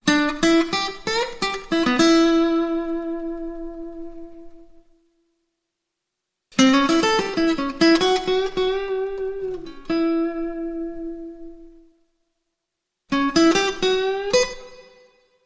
Blues Licks

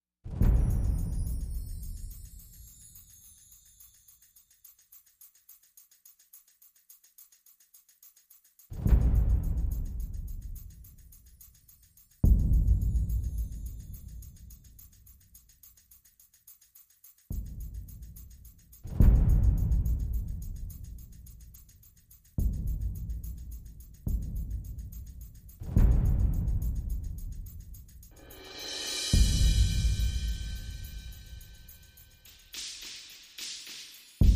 Perc Only

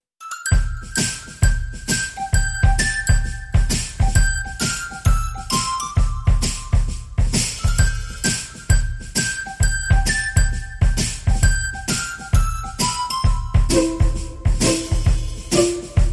Whistle Berry

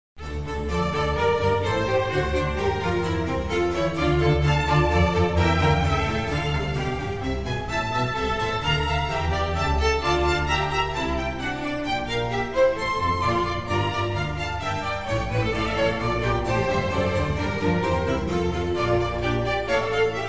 Concerto for Strings in D minor; RV129 Madrigalesco; Antonio Vivaldi

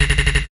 Quick Bonker

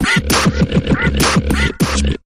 Mechanical Percussion Variation Short Version

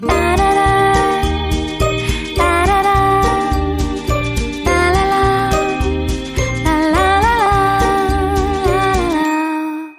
Intro Vocals

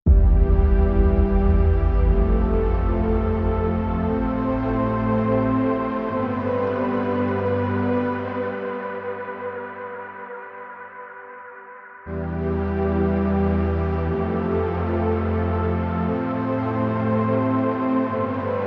Underscore

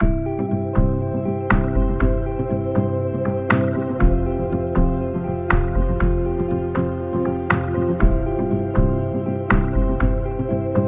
Inst

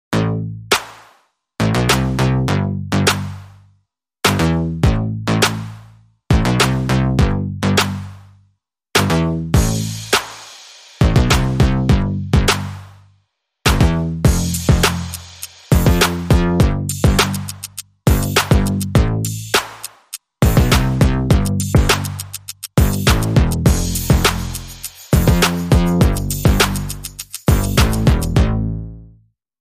DnB

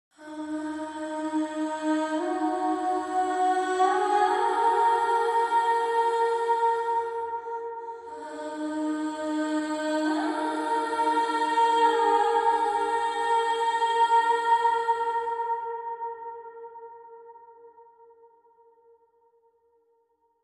Intro Choir

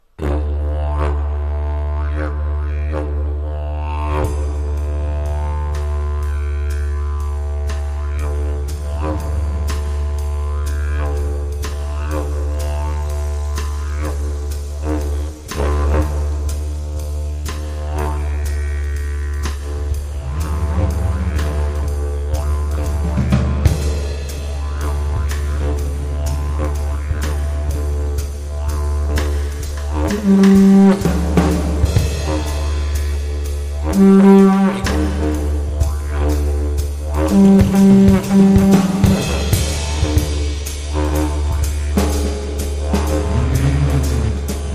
Jazzy Fusion

